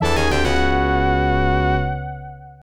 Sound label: Fanfare; Lethal Enforcers; Genesis